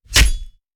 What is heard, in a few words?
Stab Bayonnet; Sound Ideas; Guns